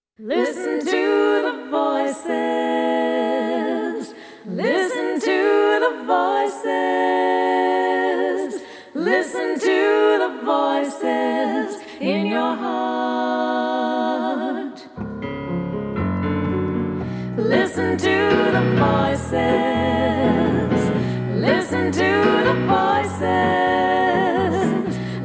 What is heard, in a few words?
Piano